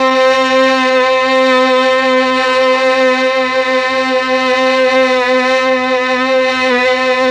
MEL STR, Legendary M400; ILIO; Propeller Island

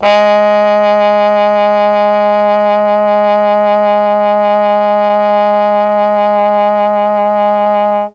G#2 TROMBONE, Mellotron; CHMB TRMBONE; Mike Pinder - Mellotron Archive CD

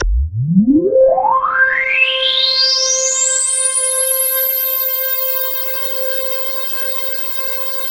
KORG DW8000, Vintage Keyboards

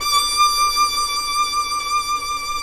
FIDDLE NOTES, D6, R

